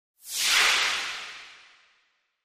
Air Release Pressur

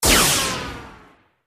Laser Zaps

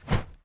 Baseball Arm Cloth W; baseball fx & games

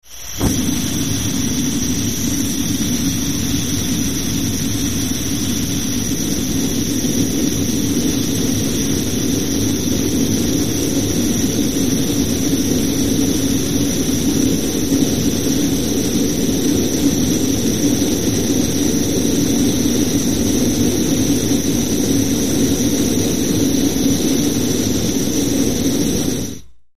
Bunsen Burner On High

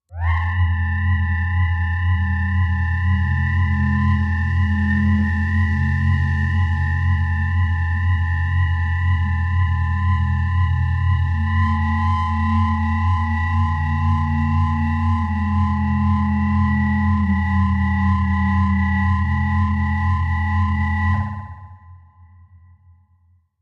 Machine, High Generator, Polishing, Moving Drone; power core hum

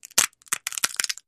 Nut Cracker Cracking

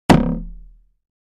Arrow Impact Wood; arrows

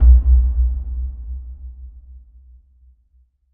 Bass Impact Low Drum; impacts

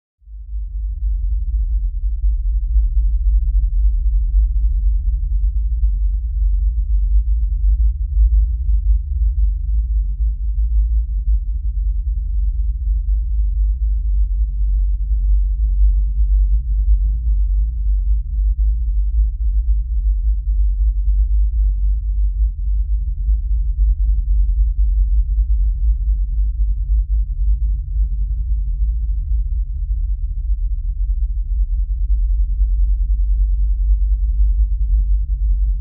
LFE Electronic Rumble with Thumps, LFE

